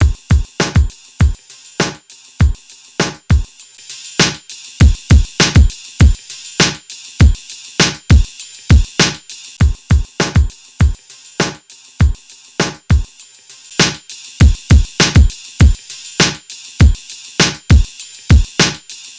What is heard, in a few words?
Drums; Solid State Logic 611EQ serie API-500